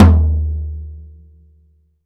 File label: TOM E, Drum Dry; East Collexion; Drum 1